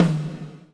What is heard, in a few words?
GATE TOM, Drums Acoustic; USB Soundscan vol.10; AKAI; 90s sample CD; GATEKIT 3